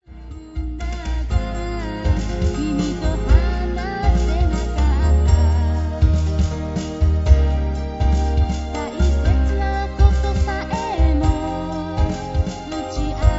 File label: step beat